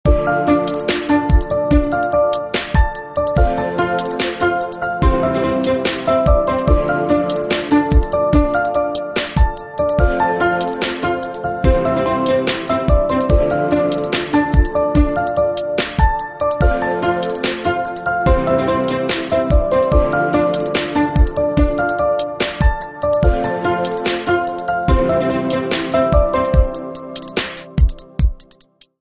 piano serene ringtone; english ringtones

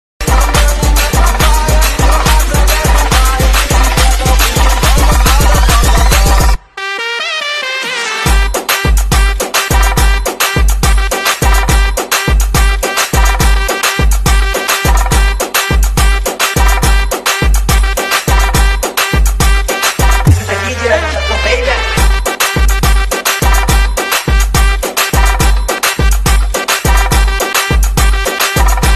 trap trance vibes ringtone

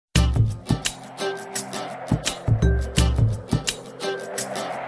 wind music ringtone

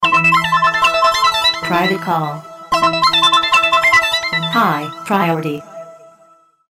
incoming call ringtone

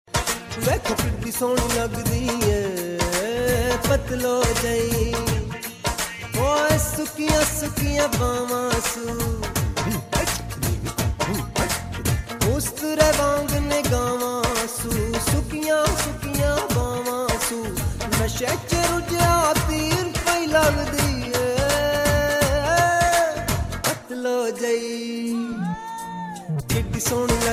song ringtone

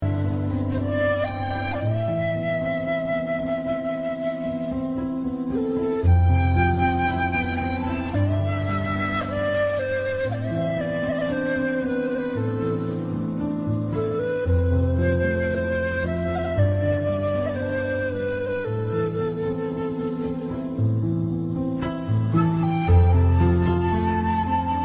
sad flute ringtone

